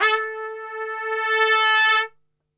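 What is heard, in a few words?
A 2 trumpet; Best Service; Brass Super Section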